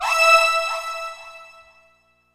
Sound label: Synth String single note